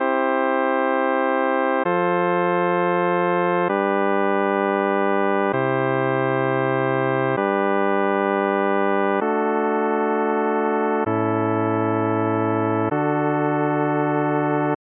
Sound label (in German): modulation von c nach d unrein